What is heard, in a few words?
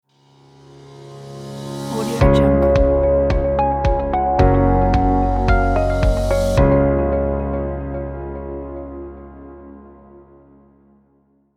corporate opener logo